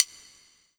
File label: Kawai K5000W Cross Sticks